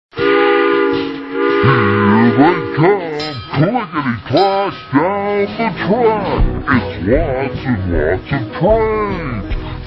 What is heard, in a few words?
clickity clack